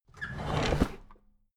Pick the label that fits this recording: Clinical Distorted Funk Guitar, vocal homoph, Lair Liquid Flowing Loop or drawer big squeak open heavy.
drawer big squeak open heavy